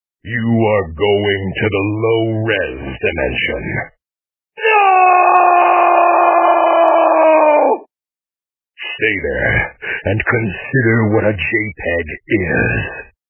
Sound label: low res dimension